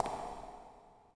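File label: echo walk, footsteps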